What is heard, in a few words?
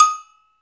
AGOGO; Metal Menus